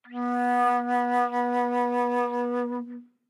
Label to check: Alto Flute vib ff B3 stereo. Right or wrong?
Right